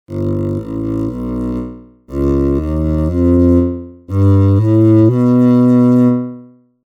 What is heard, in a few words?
bass